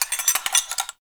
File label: Metal Rattle; Props; Warframe; Tau; Ambience